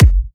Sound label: Bassdrum; Vengeance Samples